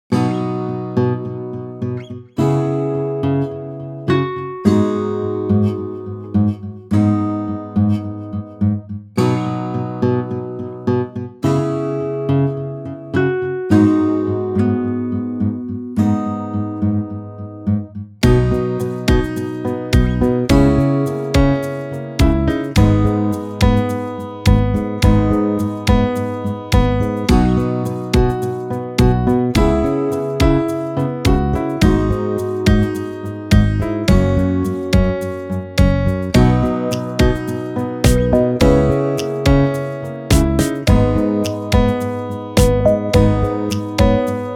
Folk Edm

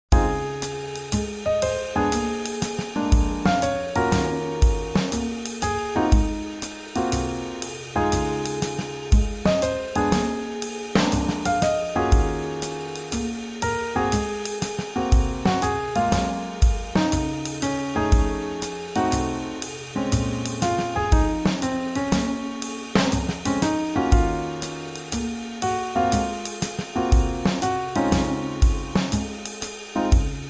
Swing Jazz

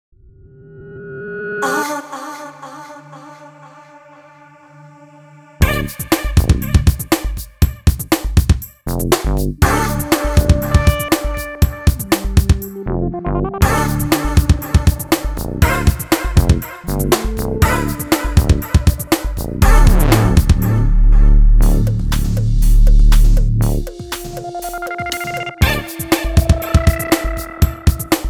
Electronic, EDM; 리듬

